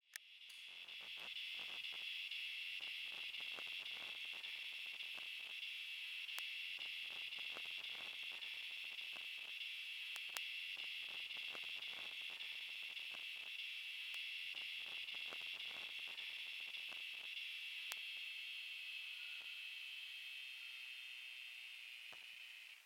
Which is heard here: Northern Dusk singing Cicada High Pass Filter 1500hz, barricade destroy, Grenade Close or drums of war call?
Northern Dusk singing Cicada High Pass Filter 1500hz